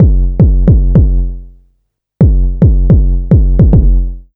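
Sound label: RAZE bass drum, drum loop; Big Fish Audio; Uncivilised G'rooves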